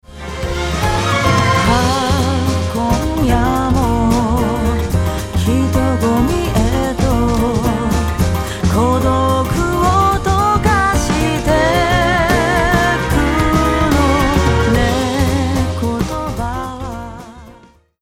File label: Bass Auto Off